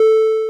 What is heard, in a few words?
elevator beep; Golden Crayon Sounds